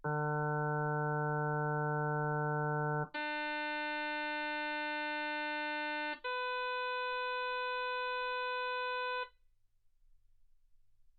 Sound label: harm sinus 48kHz